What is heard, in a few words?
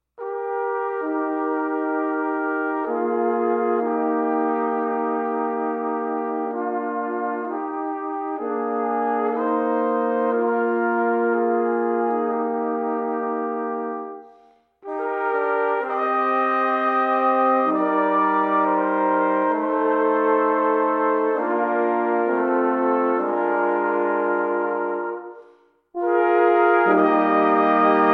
trumpet; It Is Well With My Soul